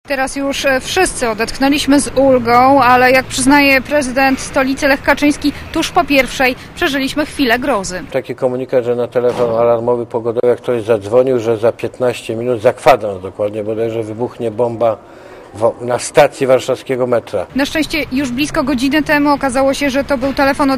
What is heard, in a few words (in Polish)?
metro alarm warszawa